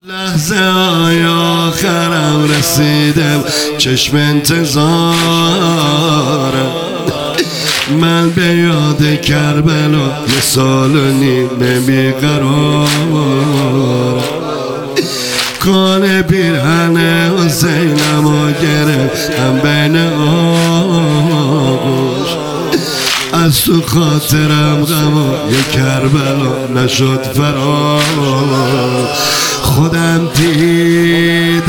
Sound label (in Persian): مداحی